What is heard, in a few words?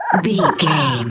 goblin fairy laugh deep